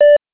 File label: beep; dtmfbox